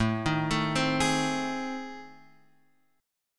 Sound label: A Minor Major 7th Flat 5th